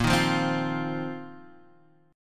A sharp Suspended 2nd Suspended 4th A sharp, Guitar, Standard; strummed; A#sus2sus4; JGuitar